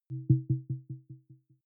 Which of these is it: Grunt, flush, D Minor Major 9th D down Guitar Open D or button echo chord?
button echo chord